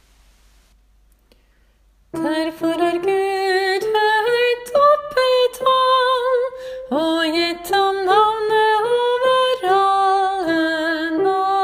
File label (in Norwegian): kristushymnen alt; åfjord